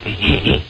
laugh

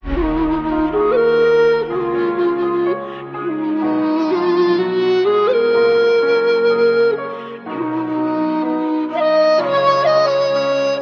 flute and piano, Sad

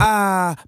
ah

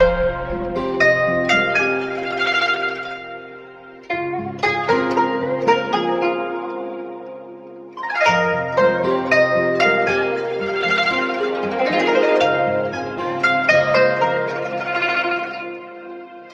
guitar

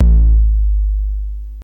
groan, kicks heartbeats

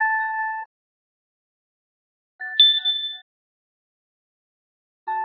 tinkles, Electronic Loops

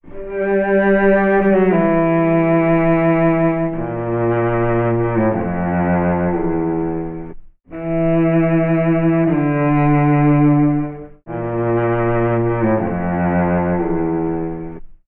strings no bass, Sad Loops

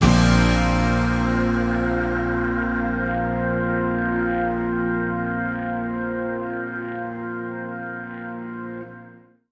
lingering keys

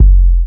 kick, Heartbeats

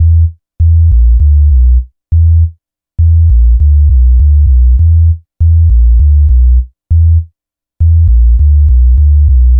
bass, Loops

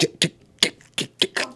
kchk kchk kchk kchk, Beatbox, Drum loops

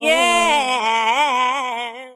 "yeah", sung

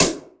chorus, snares real

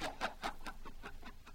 laugh kung fu villain; ooh ahh

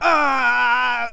ah pain; ooh ahh

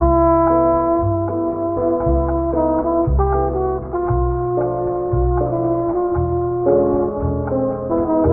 horns soft touches, phonky loops

